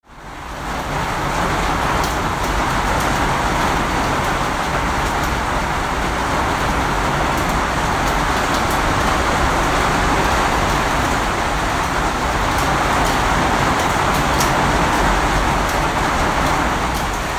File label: Downpour gecko bat on roof Quirimba Island